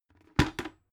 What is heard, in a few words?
bucket empty put down drop